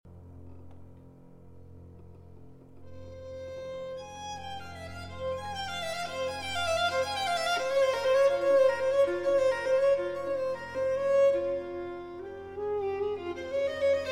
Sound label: Violin Concerto in F Minor, Winter, Allegro